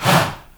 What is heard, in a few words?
HA, SHOUT, Heart of Africa; Spectrasonics; Heart of Africa 1; CH. SHOUT1